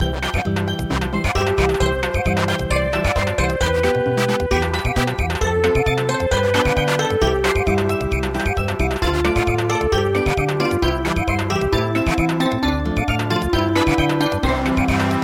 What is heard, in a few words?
Samba